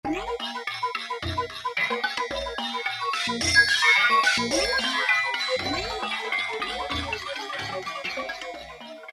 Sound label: Clock Factory; Roland PN-D50-02